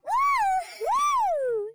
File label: Woman Woohoo; Zomboid; Lifestyle